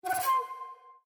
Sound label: Feed Discovered; Windows 7; Calligraphy